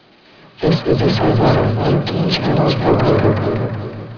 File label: ghost